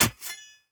machete strike soft; Rust

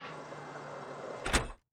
Aircrafts Su-27 Canopy Close End; DCS

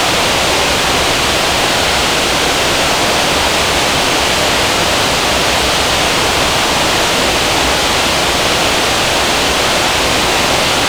Weapons Rocket Wind; DCS

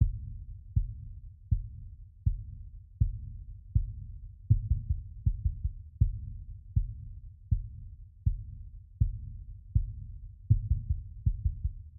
music interactive; World of Tanks

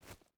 footstep hide stones jump start; Rust

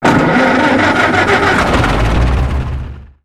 Vehicle Engines; Red Orchestra Ostfront 41-45

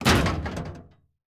door double metal close end; Rust

